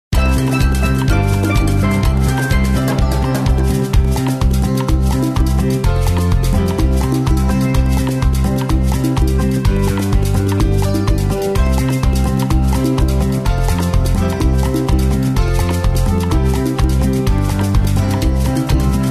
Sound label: caribbean sunset rhythm track, square dance music